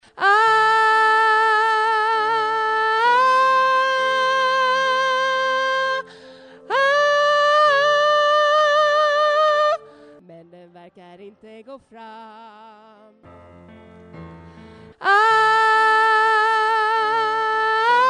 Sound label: tenor